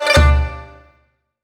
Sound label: jackpot end